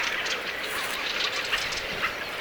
tuollainen rakattirastaslinnun aani

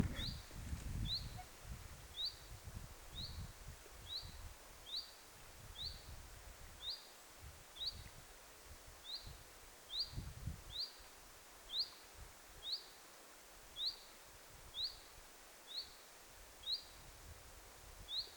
talleen aantelevia ilm tiltalttilintuja

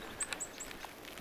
kuusitiaisen vahan pahkinanakkelimaisia aania

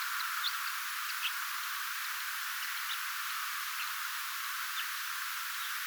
onko tassa sen jip-linnun aani tai jopa aania kenties

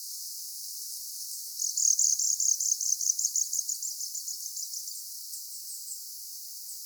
onko tuo hippiäinen

